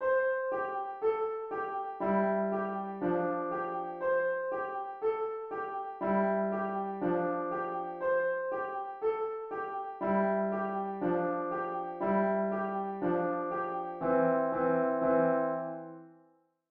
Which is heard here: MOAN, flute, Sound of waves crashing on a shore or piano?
piano